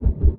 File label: bottom bump; Blind Drive